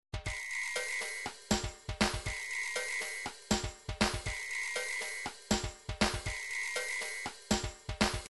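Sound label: drums pattern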